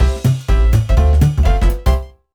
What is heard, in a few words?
Jig; eigo de tabisuru little charo